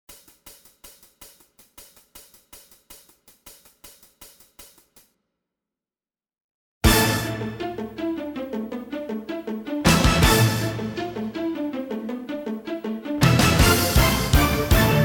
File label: drum click; Getty Show; Eclipse